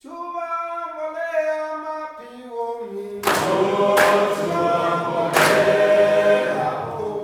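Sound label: CLAPPING CHOIR; Spectrasonics; Heart of Africa 2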